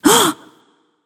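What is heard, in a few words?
nonverbal breath; smite; voicepack; izanami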